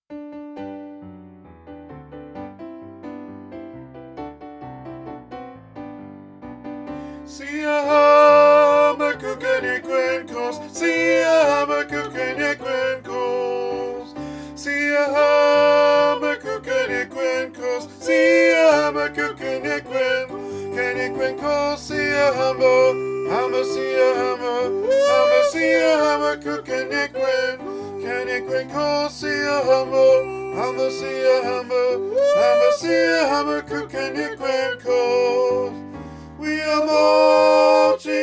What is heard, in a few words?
siyahamba alto, ladies choir